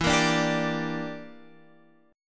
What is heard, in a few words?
A sharp 6th Flat 5th chord